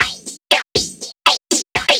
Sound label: drum loops; bb drum loops